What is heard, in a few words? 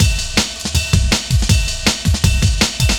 Essential Break; Jungle; Breaks; Blu Mar Ten - Jungle Jungle - 1989 to 1999 Samplepack